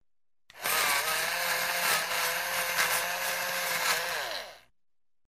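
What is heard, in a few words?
electric pencil sharpener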